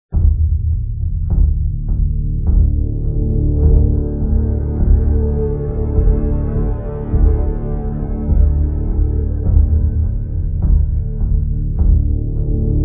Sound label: Dramatic Menu